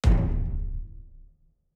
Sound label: Special Click; Fantasy Interface; Fantasy Sounds Bundle